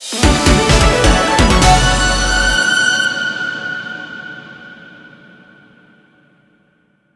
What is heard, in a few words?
free Spin Outro Music; hotline2